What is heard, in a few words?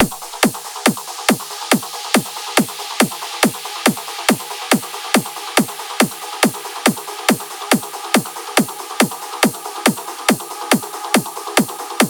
noise remix